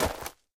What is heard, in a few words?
gravel, step; STALZONE; minecraft